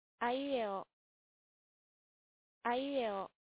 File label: stream